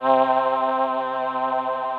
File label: Voco+ Human Full, Voices; Ableton; Factory Packs; Synth Essentials